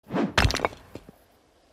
Stone Hit Sound

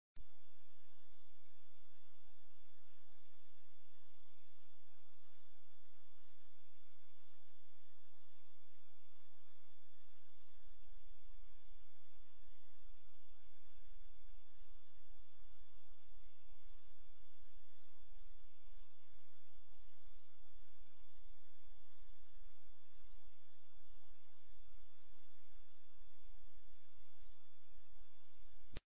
sound scare animals

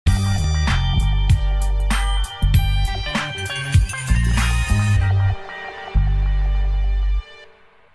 Mission passed sound; meme; GTA San Andreas

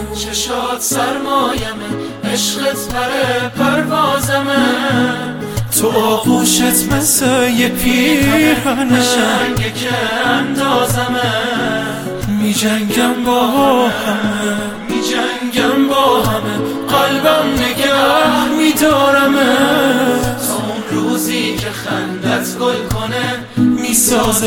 Guitar

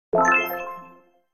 Collect Item sound effect; pickup

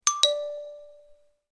Message notification; phone

